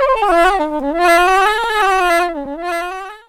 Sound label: SAX RIF; E-Lab; X Static Goldmine 2; akai; SAX RIFF1